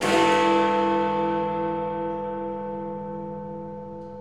ben strike, church clock strikes; sound effects and sound reinforcement

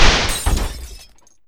chandelier explodes; sound effects and sound reinforcement; The Passion of Dracula

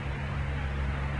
Radio hum; sound effects and sound reinforcement

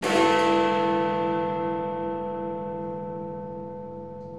ben strike, church clock strikes; sound effects and sound reinforcement

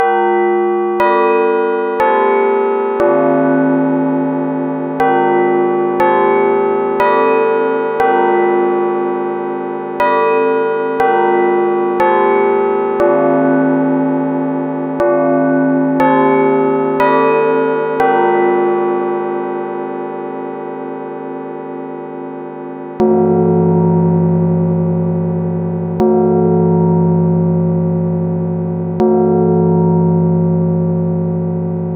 Church Bell Strikes; sound effects and sound reinforcement

